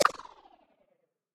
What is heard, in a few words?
click back; The Smurfs - Penalty Shout-Out; NextlevelGames